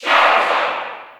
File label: Cheer English; Charizard; SSB4